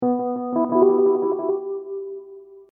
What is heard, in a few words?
Hip Hop Dark Rhodes Licks 90 Bpm Straight E; Keyboard Loops; Lynne Music